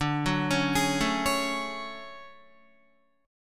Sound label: D Major Sharp 11th, down Guitar; arpeggiated; JGuitar